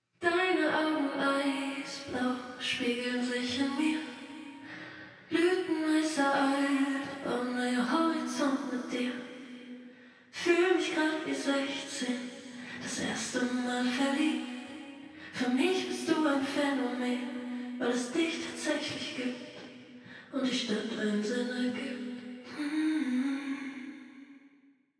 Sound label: Reverb Back and Side Walls Mid and Side Filling